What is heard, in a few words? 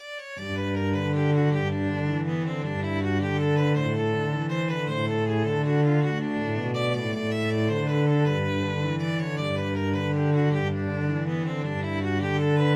mexican hat dance, violin-cello duet